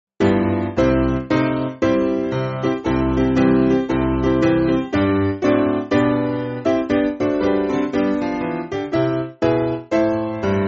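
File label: Piano, Music Backing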